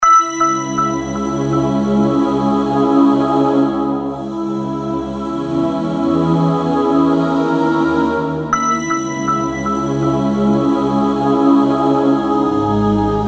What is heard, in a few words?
Breath ringtone